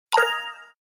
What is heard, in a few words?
Bright pop up